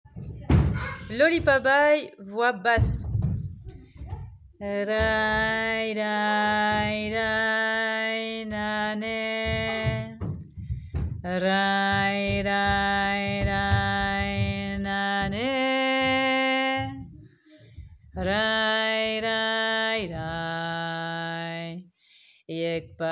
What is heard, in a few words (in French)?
basse